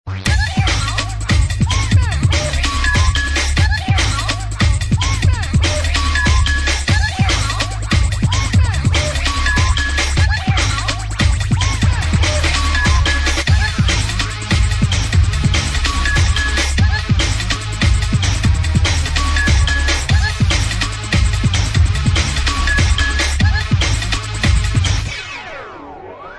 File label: Drum And Bass Version